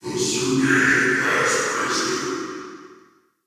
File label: Ghost Soldier Vocal Idle; Enemy; Wandering Ghost Soldier